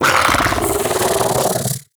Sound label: Bug Vocal Mount; Hunting Critters; Inf K Drive Bug